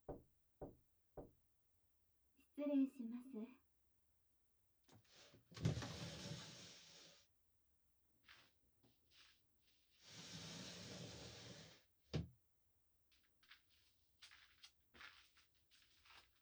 クリーム耳マッサージと耳舐め前戯, ASMR

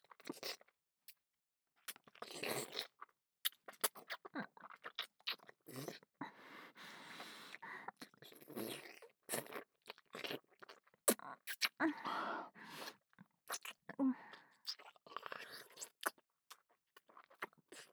キス

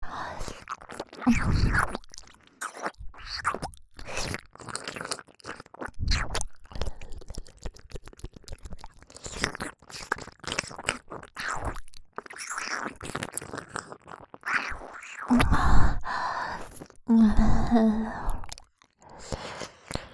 耳舐め L (左耳); 会長

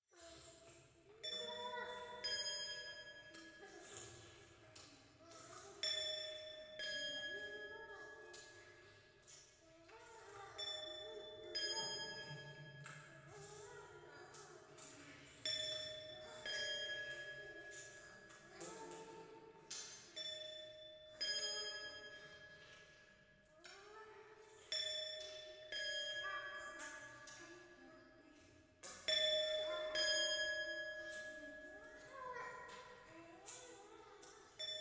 風呂 イラマチオ 寺

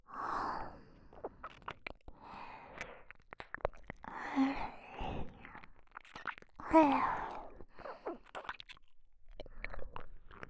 耳舐め 右 ループ

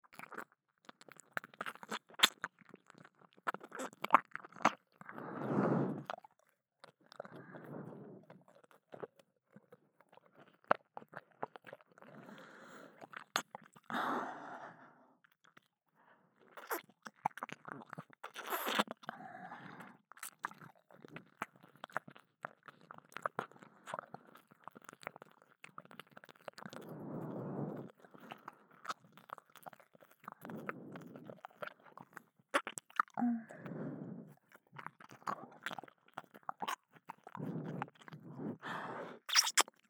左耳舐め, ASMR; アドリブ集

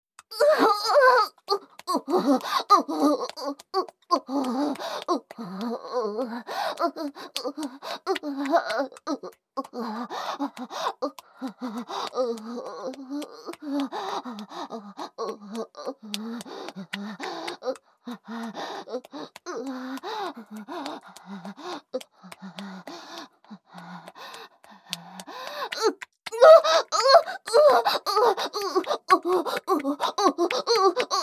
オホ声; SEあり

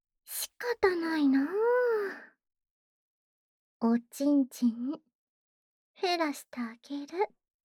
ツンデレ ねっとりフェラ, ASMR

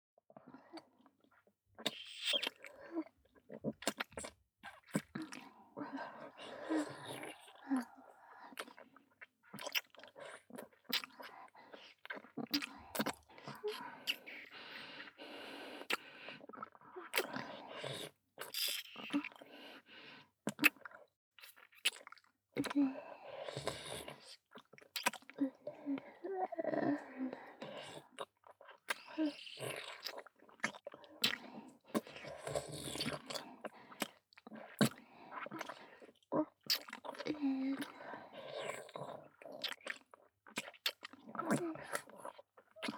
フェラ＋乳首舐め; 汎用性抜群のオナサポ素材

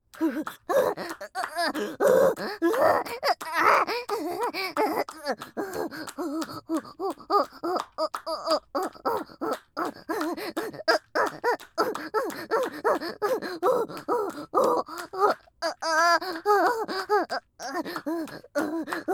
オホ声; SEあり

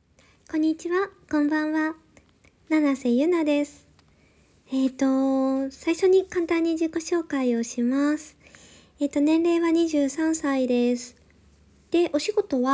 フェラ音

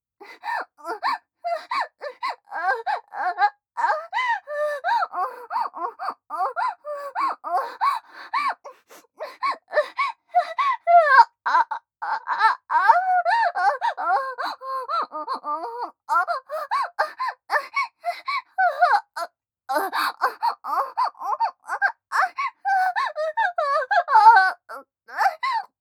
あえぎ声

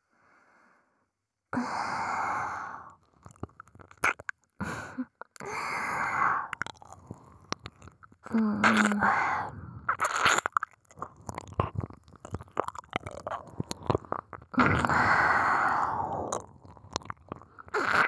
耳舐め, ASMR; SEなし

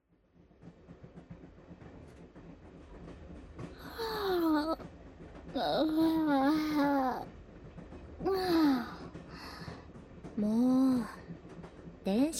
両耳舐め手コキ; 反転03 うっかり勃起したらJK達が両耳舐め手コキで抜き抜き♪

